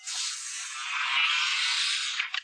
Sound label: door; sona